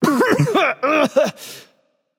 clear throat; erlangshen

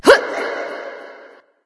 grunt jump light; smite; voicepack; hel

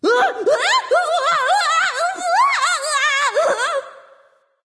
fear; smite; voicepack; nike

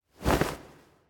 phoenix wing soft; smite; npc

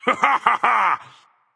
"yay"; smite; voicepack; poseidon

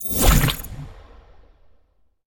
webground fire; arachne; smite; arachne skin9

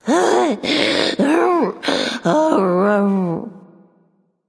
breath; smite; voicepack; kukulkan

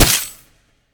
pwr hit; serqet; smite

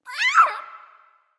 NV Ouch; smite; awilix

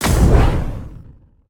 projectile; smite; poseidon

